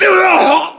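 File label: death, male; english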